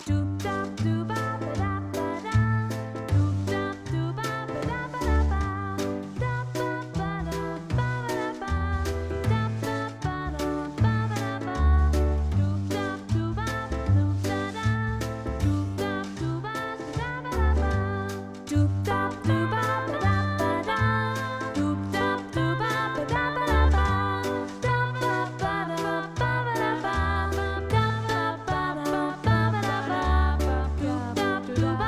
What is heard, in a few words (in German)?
Saturday Kanon vokal